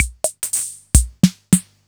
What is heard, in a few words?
Farfisa Rhythm Maker 16